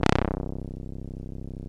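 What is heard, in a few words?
Juno brass, analog synths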